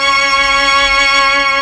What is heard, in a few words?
STR, STRING